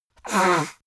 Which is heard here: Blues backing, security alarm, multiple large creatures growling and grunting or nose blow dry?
nose blow dry